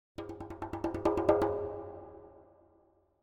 Drums Percussion Danger - Slower Drumming On A Thin Metal Percussion; Hollywood Edge; sound designer's toolkit v2